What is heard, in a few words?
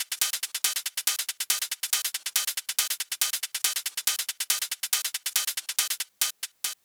Loop 140BPM, Drums & Perc; Devastating Truth Kit; Gminor; Vengeance Samples